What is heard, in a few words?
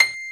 a6-d#7, piano, grand; Giga Samples Collection; ARS Grand